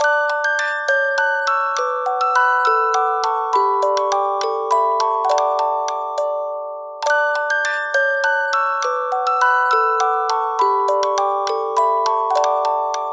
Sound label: musicbox loop